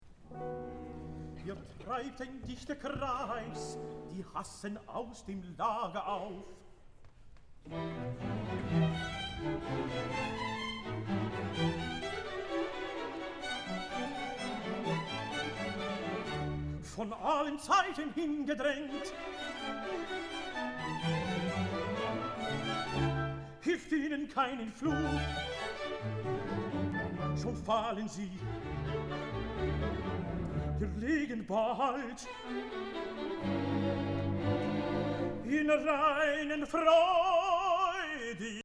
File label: Recitative; Haydn; The Seasons Die Jahreszeiten; Der Herbst; Hier Treibt Ein Dichter Kreis